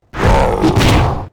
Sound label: CRAWLER REX attack